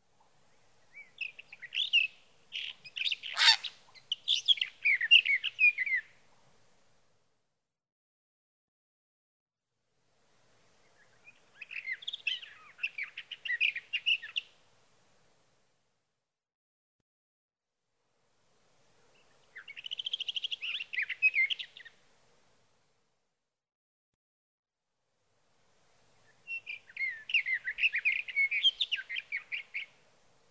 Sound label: Sage Thrasher